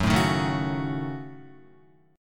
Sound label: Gb Minor 6th Gb down Guitar; strummed; JGuitar